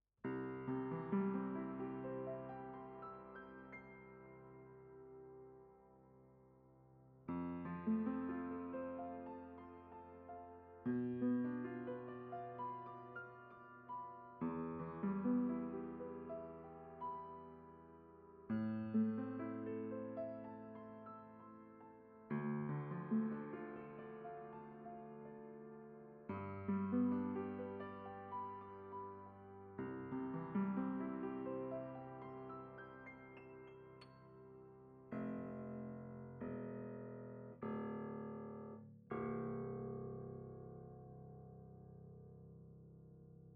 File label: 32 Bit Float Piano Minimum Preamp Gain